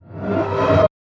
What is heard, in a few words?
horror ghost scream; soundscrate